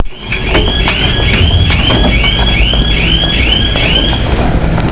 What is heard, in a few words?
AVM alarm